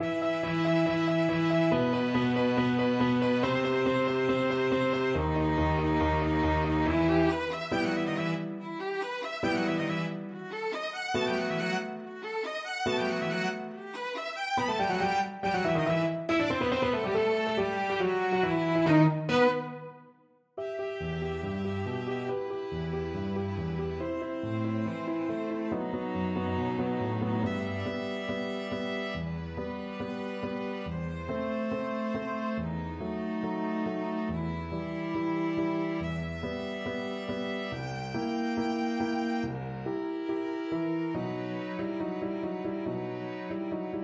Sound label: piano quartet; mozart sym25